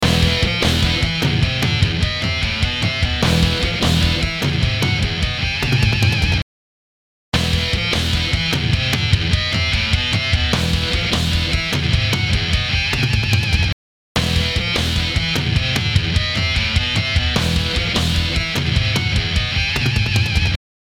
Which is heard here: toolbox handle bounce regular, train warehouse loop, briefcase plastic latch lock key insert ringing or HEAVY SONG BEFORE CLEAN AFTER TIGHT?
HEAVY SONG BEFORE CLEAN AFTER TIGHT